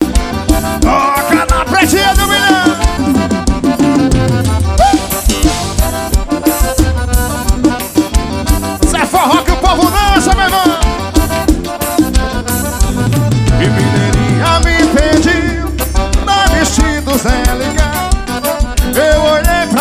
xote ao vivo; xote na br

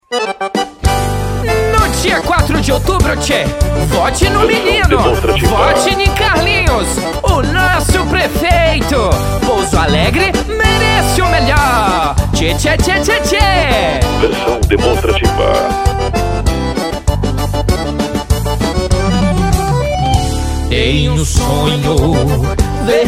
jingles politicos parodia gaucha; JINGLES POLITICOS 2020; STUDIO LAKO

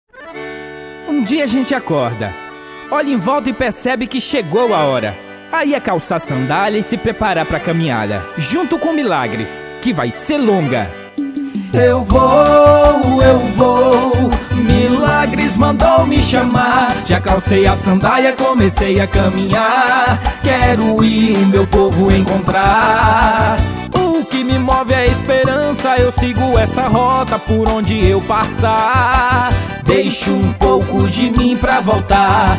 Jingle Vereador Prefeito Eleição Campanha Politica

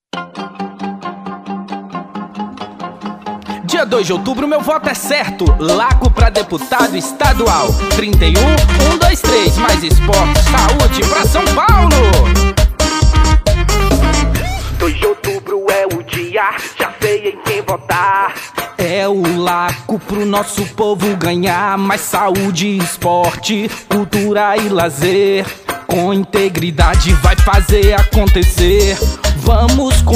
funk jingle; Deputado; Jingles Politicos

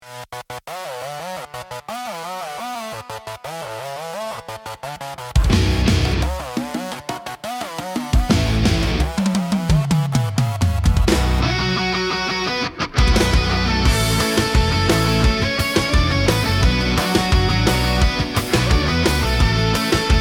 sertaneja

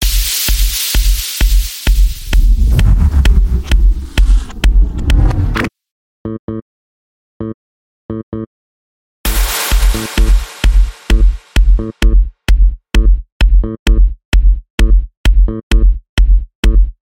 som automotivo so grave violento

